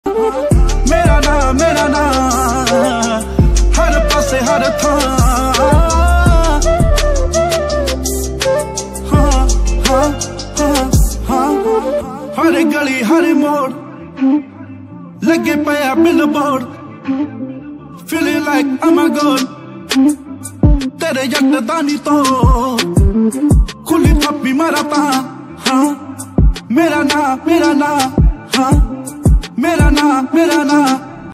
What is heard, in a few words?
song ringtone